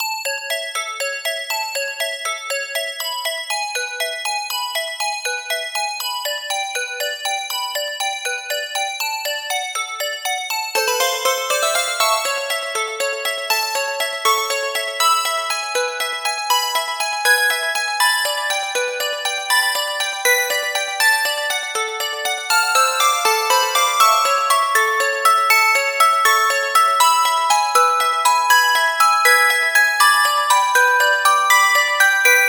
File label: Load alarm; Sony Ericsson; PlusFourSix; SE Sound Project; Lars Edvall